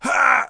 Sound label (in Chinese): 男 受伤; renlei